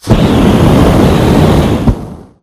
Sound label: amb fire blast; Titanfall 2